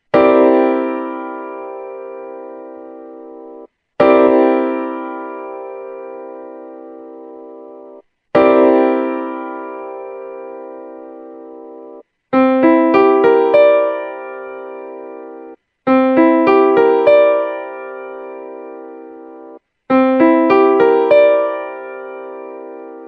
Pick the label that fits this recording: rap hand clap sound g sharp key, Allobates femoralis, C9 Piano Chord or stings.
C9 Piano Chord